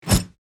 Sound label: Grenade throw launch whoosh; military equipment sound effects